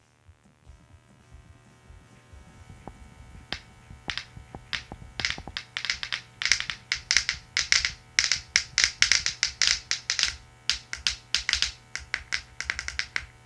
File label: A lot of tracks of sounds of rocks being played at the same time